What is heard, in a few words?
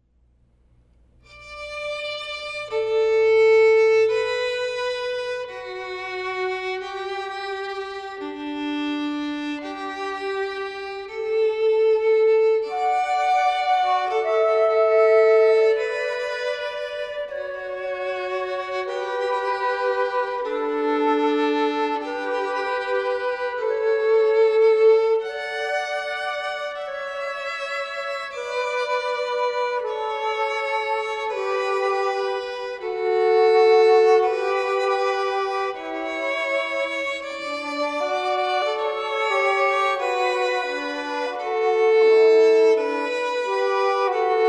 Flute Canon In D